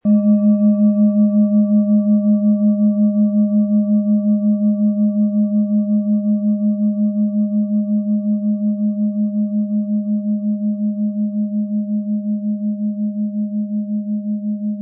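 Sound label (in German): Uranus & Saturn Klangschale, Ø 21,2 cm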